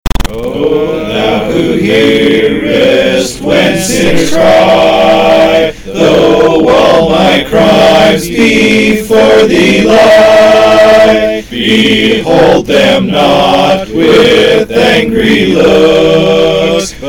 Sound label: harmony